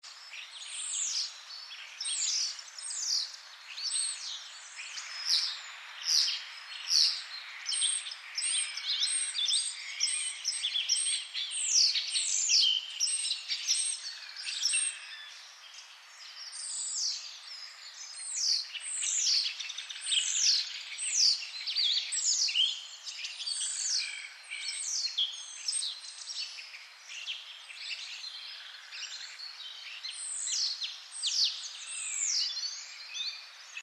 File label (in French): etourneau chant